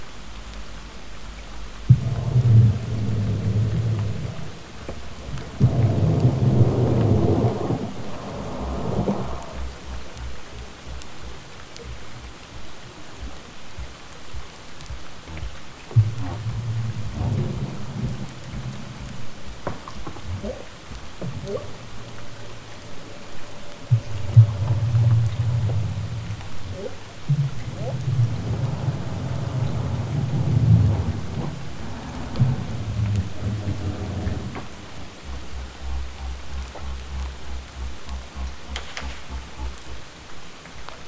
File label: vocals AMPLIFIED